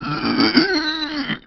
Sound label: zombie die, male